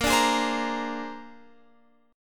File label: A sharp 9th A sharp Guitar Open F; strummed